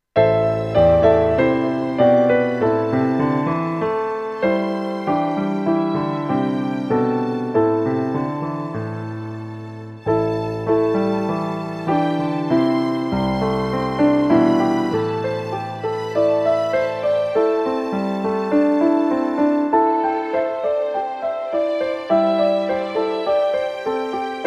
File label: accomp; MingSi